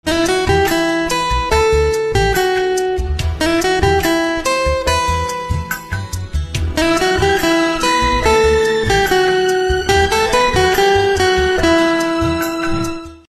Guitar Instrumental Ringtone

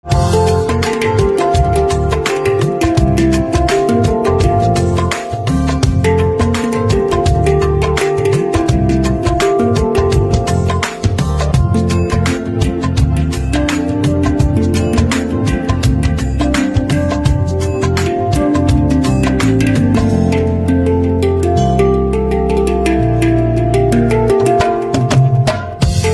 Handpan

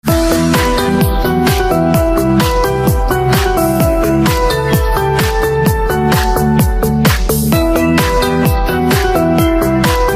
Piano Instrumental Ringtone

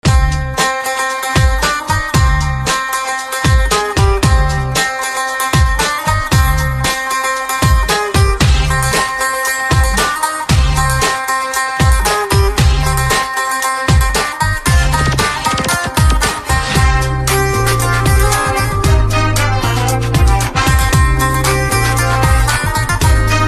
Guitar Instrumental Ringtone

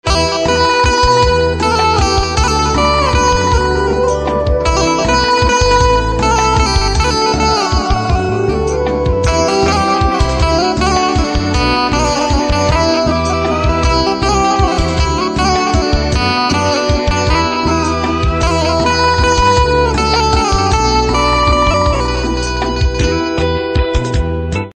Banjo Ringtone

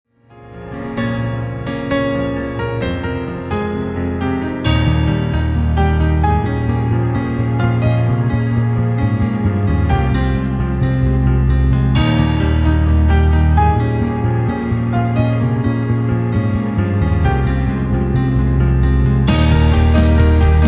Piano Instrumental Ringtone; english instrumental ringtone

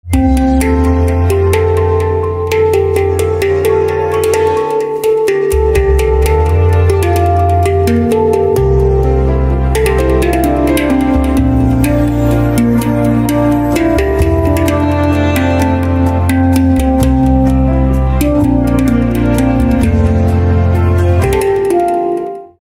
Handpan Instrumental Ringtone

